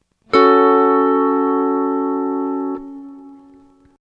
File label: f sharp m3, C6 Minor Chords, steel guitar